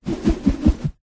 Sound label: foley rope spin swing fast whoosh